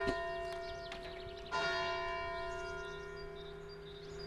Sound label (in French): cloches de l eglise; Le bourg